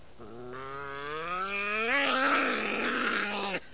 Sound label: growl